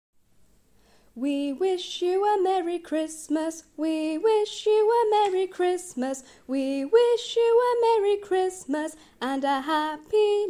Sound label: merry Christmas song